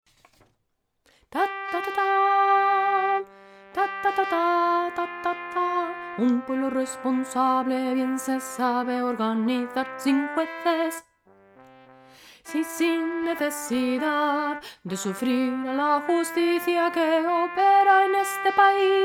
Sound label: alto